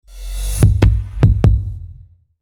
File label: AUDI Logo Sound